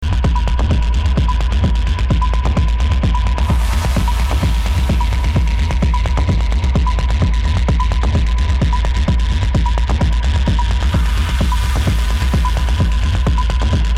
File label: HISS